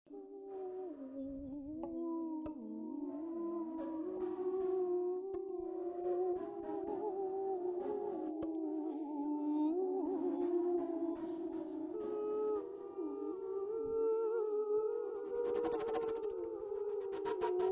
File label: VOC FLUTE; Live Aufnahmen